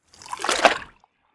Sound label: water slosh